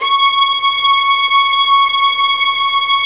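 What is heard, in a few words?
violin section, Arco Violins; E-MU Producer Series Vol. 2; More Studio Essentials; Composer; Arco Violins III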